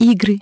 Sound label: menu; Hyundai H-MP718